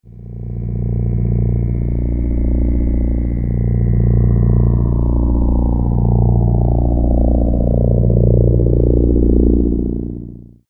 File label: tractor beam